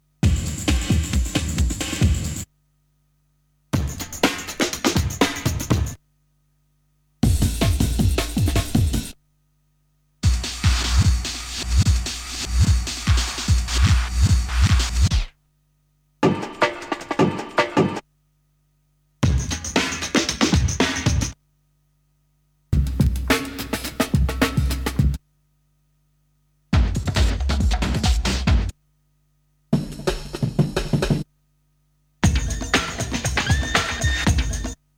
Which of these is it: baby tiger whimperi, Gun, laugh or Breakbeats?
Breakbeats